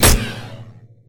auto fire; smite; apollo v4 skin14

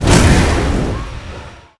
phoenix fire; smite; npc

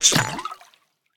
potion; Smite; Baron Samedi

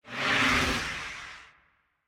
ghost run; smite; zhongkui

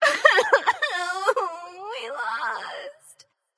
boohoo light; smite; voicepack; hel

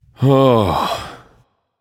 nv sigh; smite; achilles

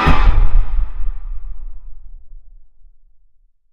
impact; Smite; Zhong Kui

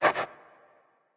dog pant; smite; scylla

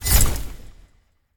pwr swing; serqet; smite

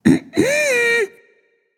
clear throat, voicepack; smite; jorm

